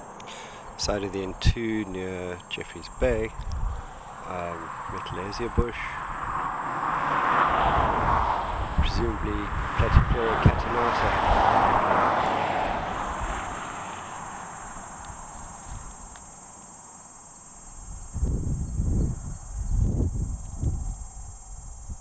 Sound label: P.catenata, Jefferies Bay